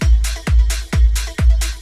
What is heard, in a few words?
dance pack full loop